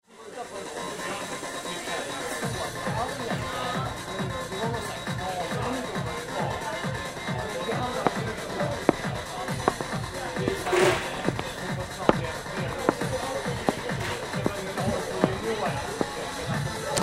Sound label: Car Accident